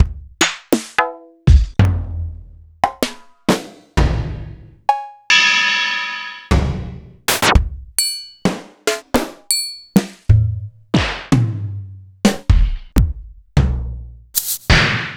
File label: Alesis DM512